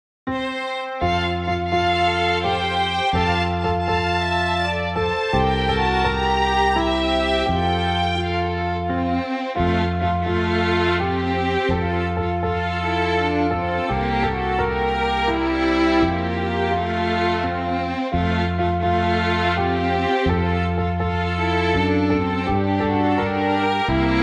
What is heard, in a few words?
O Christmas Tree Karaoke